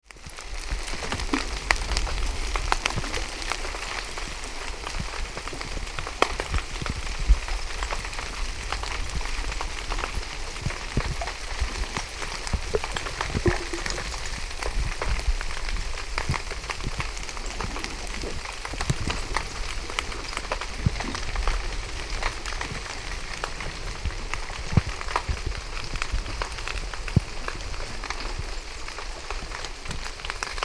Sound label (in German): aaresteine reise schoenausteg